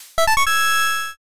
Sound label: stream start